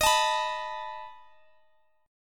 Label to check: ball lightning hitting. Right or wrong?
Wrong